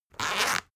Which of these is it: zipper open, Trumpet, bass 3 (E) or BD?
zipper open